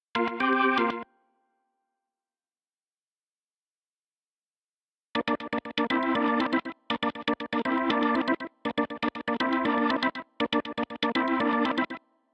organ

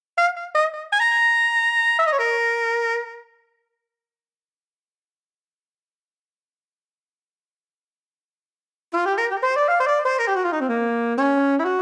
sax

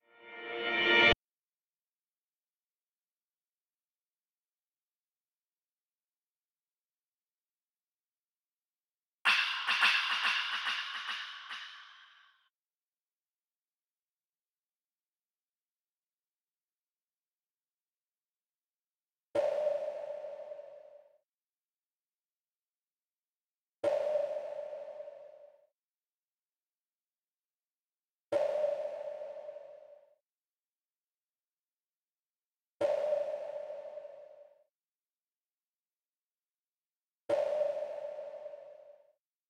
sfx; starlite

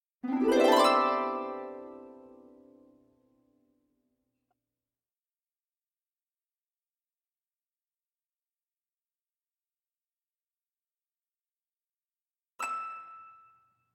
harp

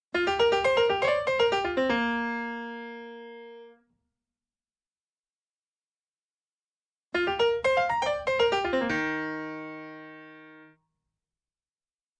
piano

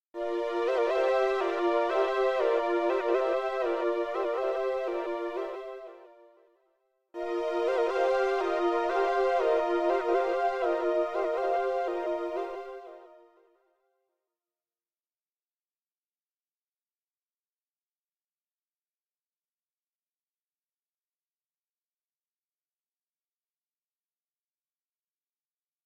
pad

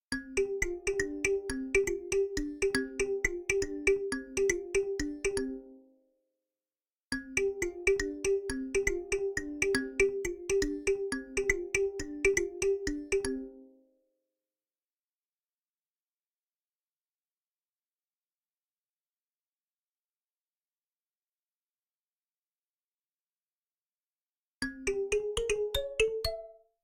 kalimba

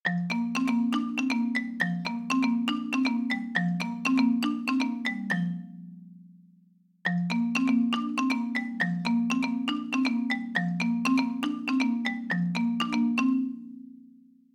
marimba

